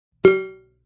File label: Pong; Pings; multimedia